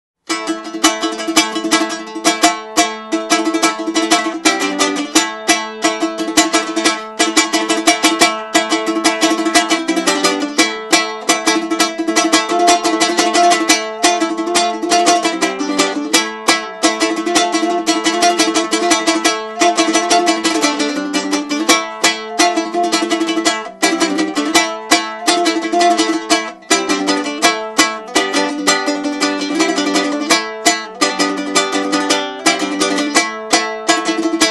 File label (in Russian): Импровизация на Пандури